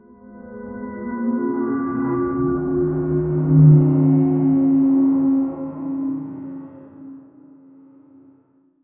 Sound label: deception veil ominous low, ethereal